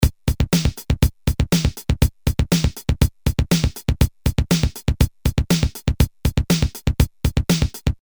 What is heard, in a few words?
8 Beat, Boss DR-220A Preset Patterns